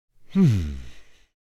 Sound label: Man thinking hmm